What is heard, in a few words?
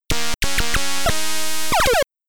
Game Over (Beeper); zx spectrum; tetris